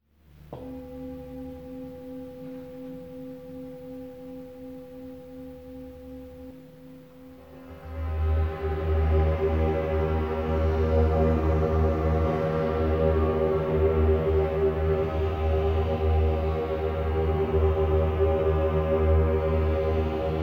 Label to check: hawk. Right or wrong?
Wrong